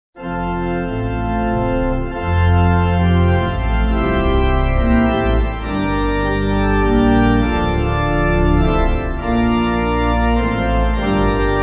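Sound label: Pipe Organ; Communion; Dudman; Acclamations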